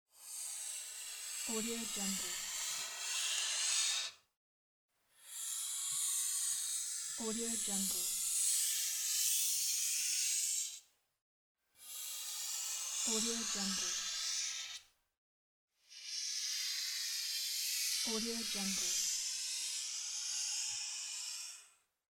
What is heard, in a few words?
marker drawing slow and long line